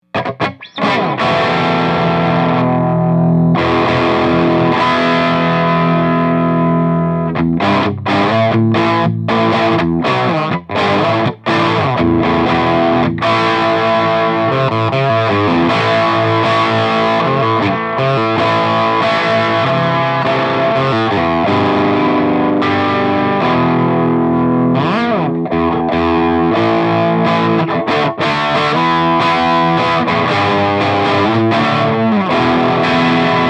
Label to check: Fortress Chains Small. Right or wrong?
Wrong